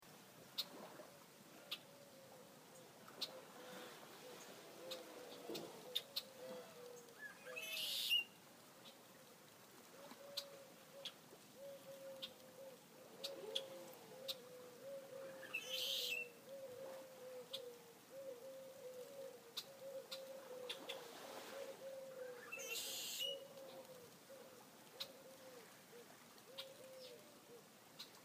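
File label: red winged blackbird, female, Abaco Bahamas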